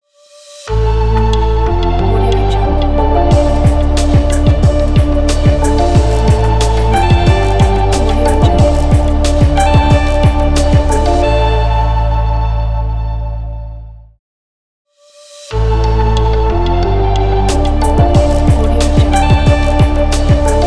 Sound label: experimental technology logo